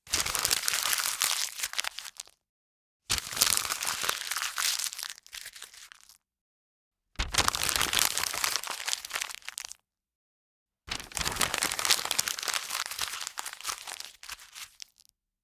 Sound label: paper crumple